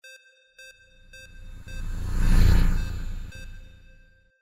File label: SCIFI SPACESHIP PASSBY; windy beach audio